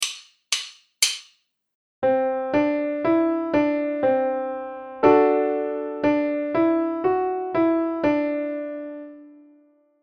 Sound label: 3 tone scale C D